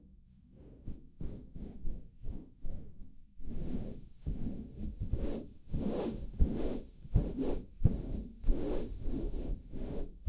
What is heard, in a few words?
asmr smooth ball gliding